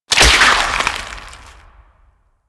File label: Symbiotic Link Impact C; Warframe; Warframes